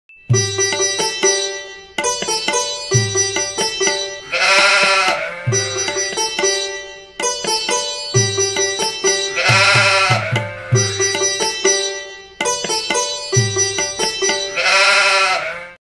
sitar verb

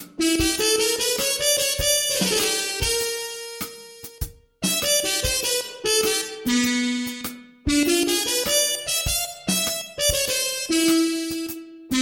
Trumpet Bubble Harmon Mute; Aeternus Brass; Syntheway

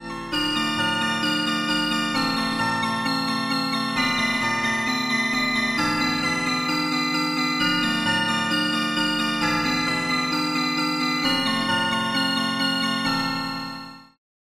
Tubular Chimes; PERC; Alphatron Oscillator; Syntheway

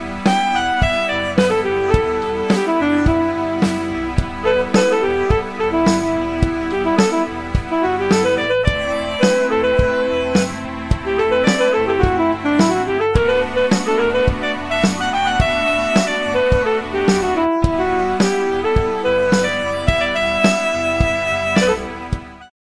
Alto Saxophone; Saxophus; syntheway

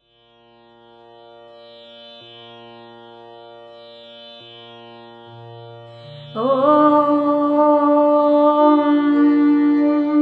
mantras meditation; devi

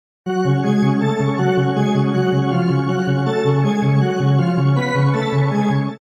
Rotary Speaker Chorale Panning Organ Wet; AmpVibe; Syntheway

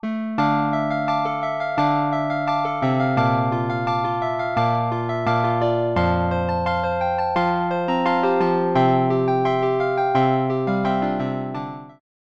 Electric Piano; Waverator Spectra

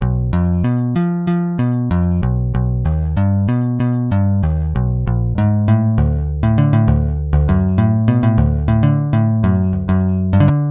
Electro Acoustic Bass; Bassesland; Virtual Acoustic, Electric and Synth Bass KONTAKT Sample Library for NI KONTAKT; Syntheway

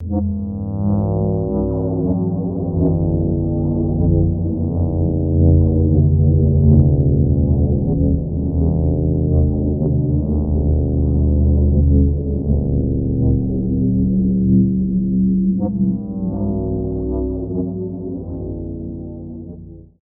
ATMO Underworld Contact; SpheratorFM

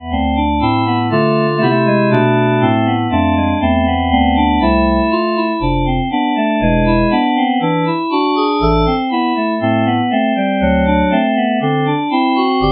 Shrine Organ; Harmodion; Syntheway

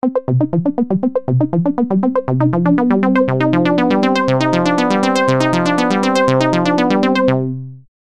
Acid Steps; Stepattern

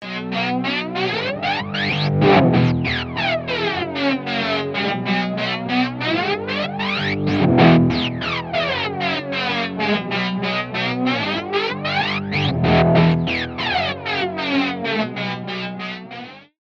Stereo Flanger, Infinite Barber Pole, Guitar, Wet; Sonicircuit; Syntheway

